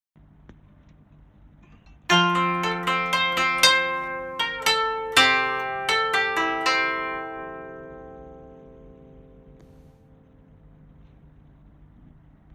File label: koto